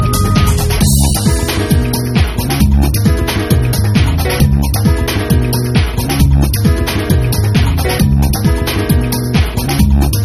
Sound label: string